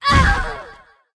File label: vampire damage, monster; rigel